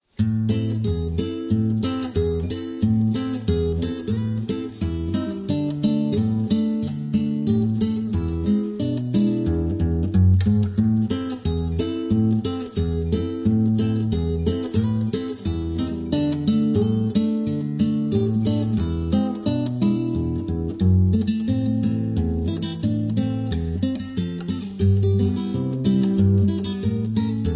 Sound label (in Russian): gitara; minecraft; Votive Content